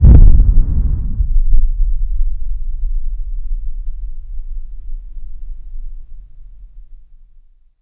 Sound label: mortar exterior